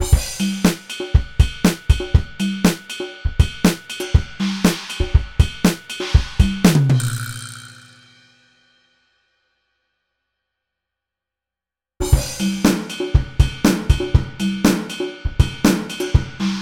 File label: drum bath church vacuum studio vase; tivoli